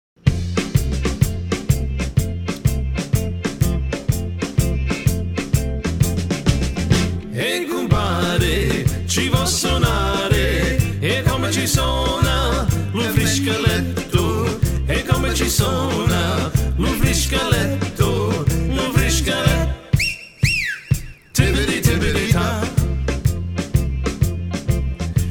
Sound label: Italian Medley